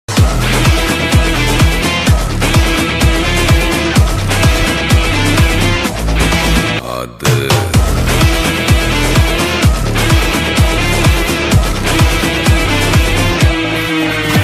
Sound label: Ringtone